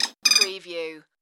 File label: Tui Tweet